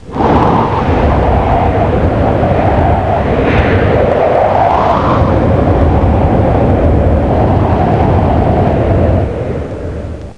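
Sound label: strong wind; ziranjie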